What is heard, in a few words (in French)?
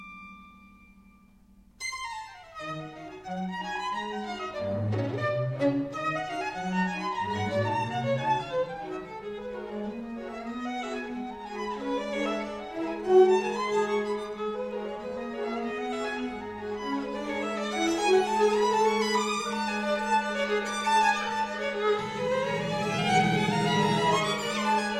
Concerto pour violon et orchestre Allegro